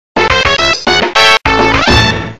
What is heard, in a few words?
Pass a Race, Music; Banjo-Pilot